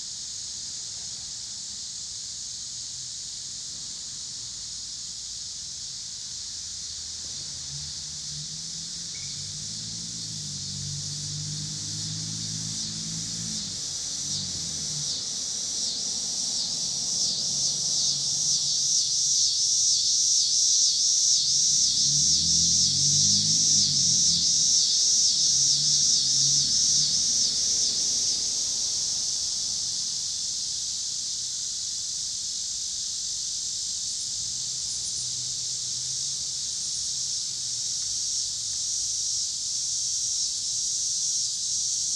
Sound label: cicadas and cars, Pittsburgh PA